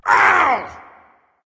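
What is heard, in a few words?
non verbal ouch, voice; cernunnos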